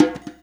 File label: DJEMBE, Percussion; InVision Interactive; Percussion Slam